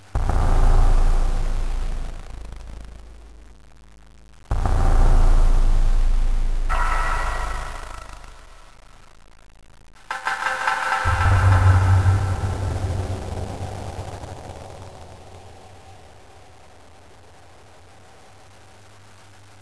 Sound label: metal